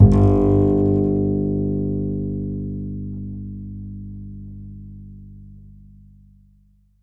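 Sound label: double bass G1, Jazzistic; Univers Sons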